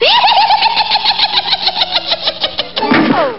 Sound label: cackle, spooky sounds